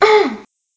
grunt female

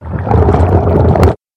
monster shark

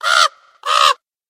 monster raven

